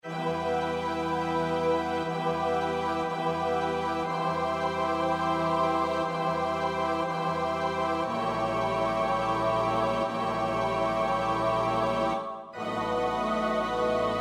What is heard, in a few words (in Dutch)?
S-A-T-B, koorpartij oefening; Vivaldi (Antonio); Gloria RV589